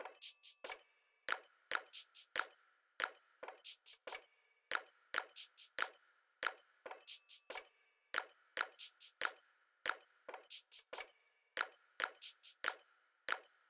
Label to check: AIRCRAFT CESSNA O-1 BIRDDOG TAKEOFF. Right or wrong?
Wrong